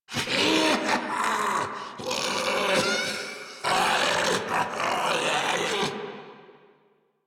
Laugh Ghoul Sarge, Barks Battle

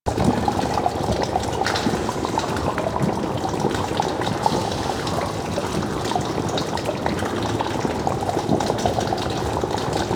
Vial Hazard Poison Splashed Loop; Entrati; Hazards

